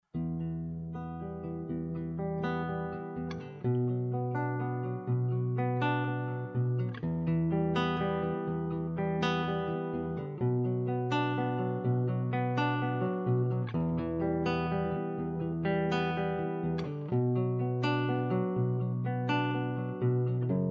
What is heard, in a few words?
Acou